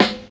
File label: studio hip hop snare single hit b key, acoustic snare sample tuned to the B note